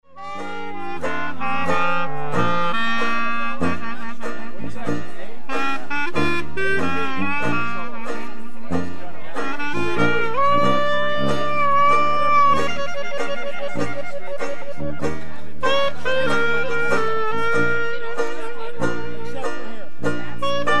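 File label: blues clarinet, jazz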